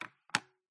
household living room lounge remote control small wood table